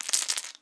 chain, weapons, negev; csgo

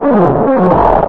alert, strider; scmod

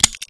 zoom, weapons

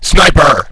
sniper

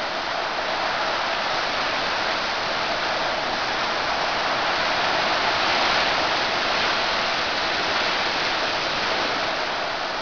wind weak

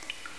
water drip

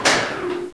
platform stopped

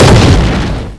explode, weapons

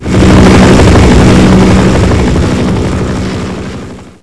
chin fly by, chinook

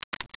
keyboard

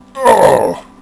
pain; hassault

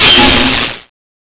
overheat, weapons

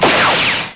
eye beam fire, weapons

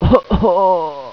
die

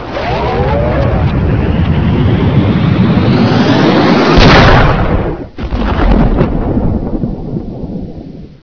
timewarp

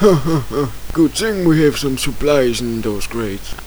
level warning

